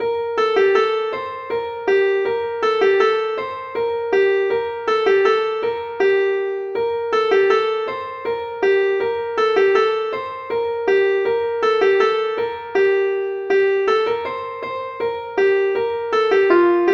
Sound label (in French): suite hanter ancien